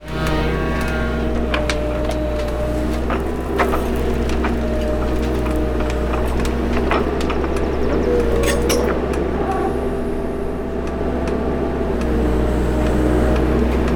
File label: Excavator Working; Mechanical Wave; Excavator Sounds